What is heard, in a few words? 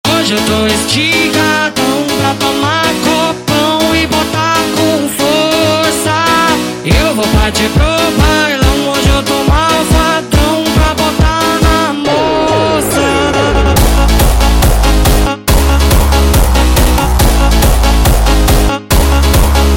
Jumpstyle sped Up